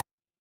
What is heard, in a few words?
ui hover